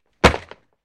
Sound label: Football Catch Gear, football